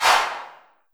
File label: LR C808, TR808